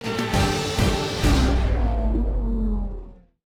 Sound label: Sting; F1 2018; ps4 windows xbox one gamerip 2018